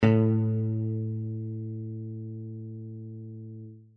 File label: guitar a